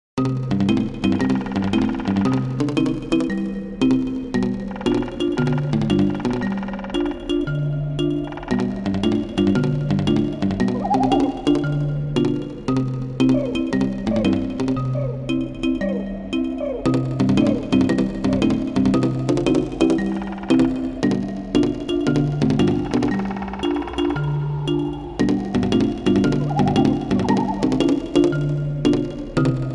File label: Inside The Treetops - Autumn; Banjo-Kazooie; Click Clock Wood